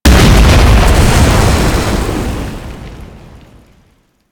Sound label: APC Explosion; Enemies; Lasrian; Warframe